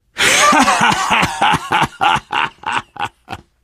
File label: laughter, biker; voice